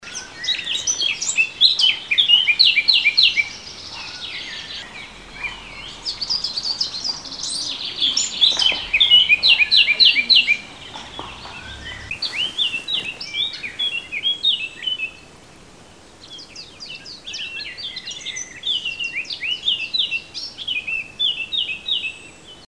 leiermoench, vogelstimmen

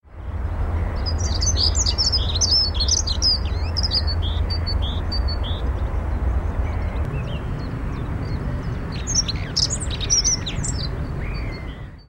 Heckenbraunelle

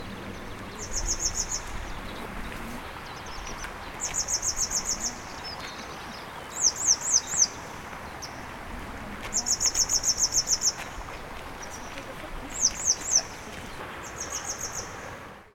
gebirgsstelze; Motacilla cinerea; Stelzen

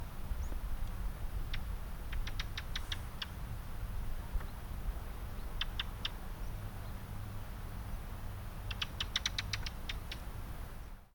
ringdrossel; Turdus torquatus

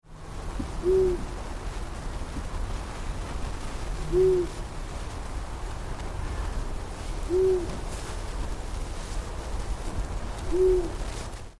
waldohreule

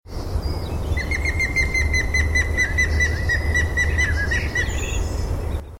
grauspecht

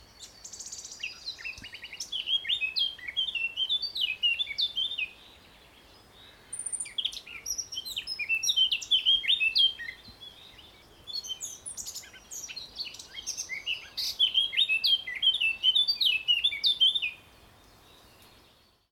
moenchsgrasmuecke

